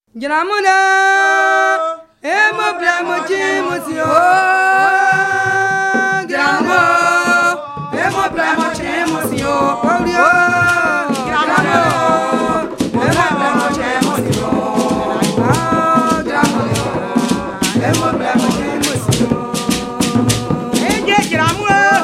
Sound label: grebo choir, grebo song; elwa 2023